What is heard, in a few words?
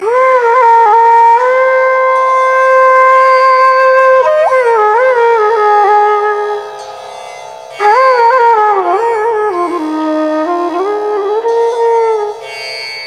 Flute Classical